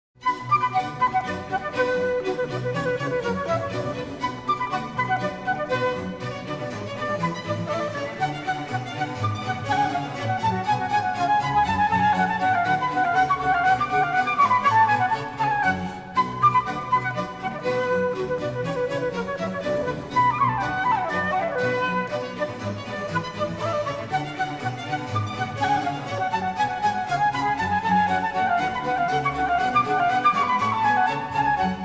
Orchestral Suite #2 In B Minor, BWV 1067 - Badinerie; Bach